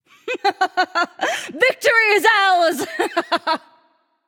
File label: "yay"; smite; nuwa